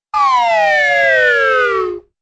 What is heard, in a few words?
slow descending slide whistle